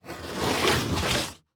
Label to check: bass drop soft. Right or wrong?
Wrong